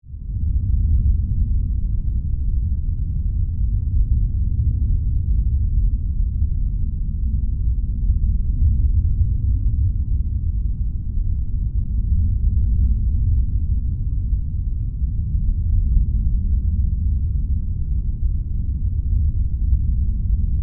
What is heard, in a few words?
Ambience Planetary, Scifi, Ambience, Planetary, Rumble, Deep, Space, Science, Fiction; Blast Wave